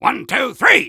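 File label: taunt rock paper scissors exert; Soldier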